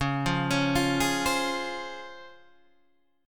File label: C sharp Major 7th C sharp, Guitar Standard; C#M7 arpeggiated; JGuitar